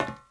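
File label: boot metal walk, footsteps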